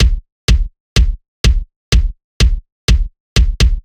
Add Kick A; Сэмплы ударных (Disco, House); 125BPM; Beat; Loops; Барабаны; Ударные

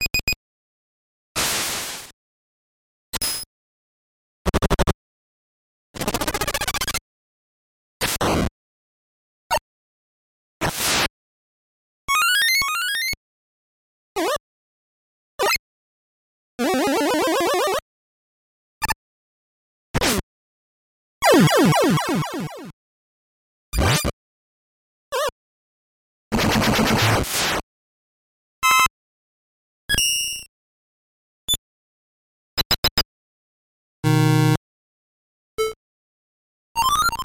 effects; mega man 2

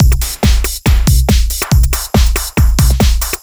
Kaputnik Beat B, trance

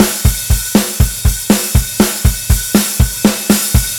Cannon C

